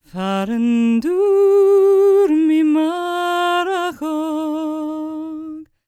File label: GAELIC, World Voices, GAELIC FEM